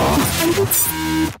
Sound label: glitch sound scary